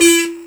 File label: BUZZ XYLO E 5; Spectrasonics; Heart of Africa 1